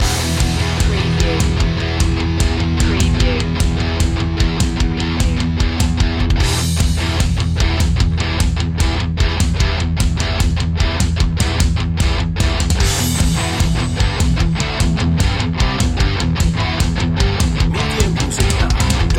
thrill junkie loop

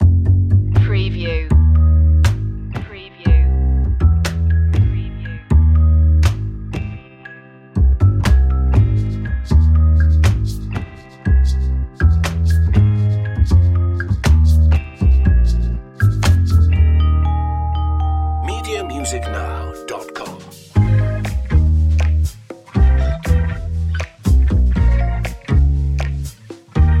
funny mystery bed

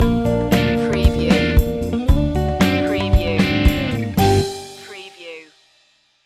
childrens soft rock stinger

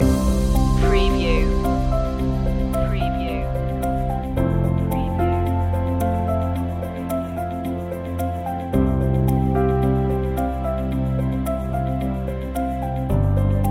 look up loop

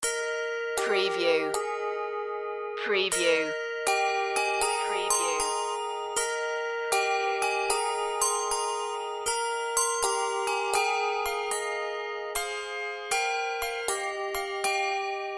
handbells; good christian men rejoice

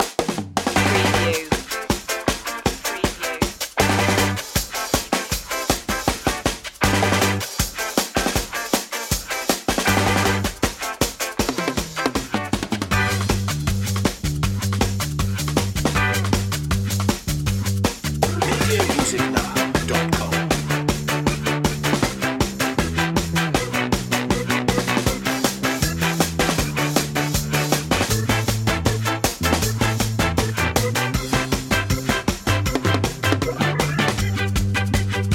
ska jump

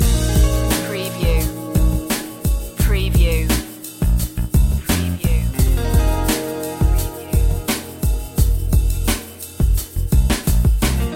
lounge living loop

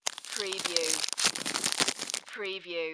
Cracker Package Open

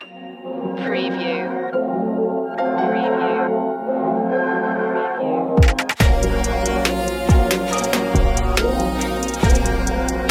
smooth soul vibes no vocals

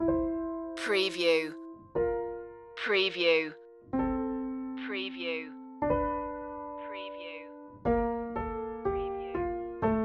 my home town loop

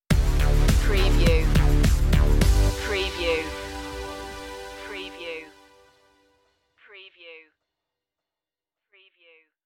sparkle stinger

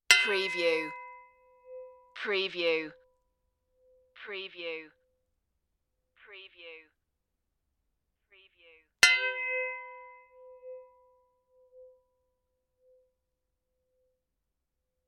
metal hit gongs

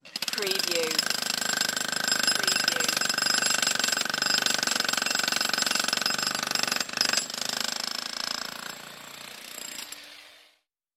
pneumatic hammer

